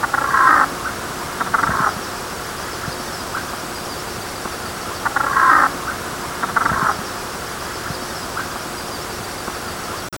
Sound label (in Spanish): pato picazo